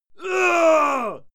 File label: male fight moan heavy; soundpool